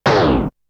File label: Hit; ZERO G - Datafile DISC 3